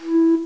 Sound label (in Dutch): fluit; The Amsterdam Marihuana Guide